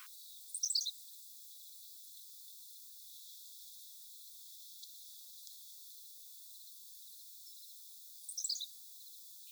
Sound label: Henslows Sparrow; Jennings Chapel Rd